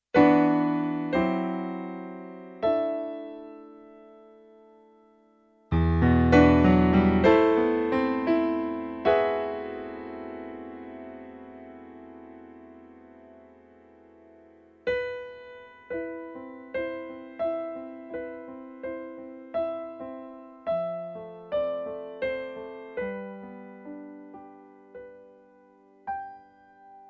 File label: folk song